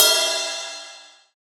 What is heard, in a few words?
crash cymbal d sharp key; drum sample; single hit; one shot